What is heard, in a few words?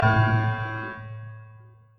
piano A2